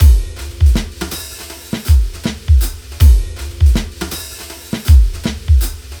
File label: 080 Chill Pop Brushed Drums; Pop Rock; Moderate Tempo; Pro Tools Essential Loops; Digidesign